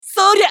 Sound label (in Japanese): ファイター男 攻撃